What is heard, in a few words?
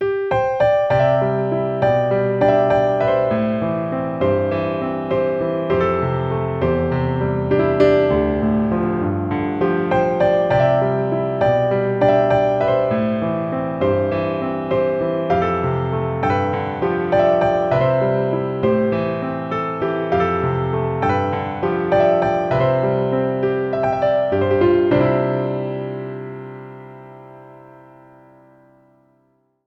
Twanging Exercise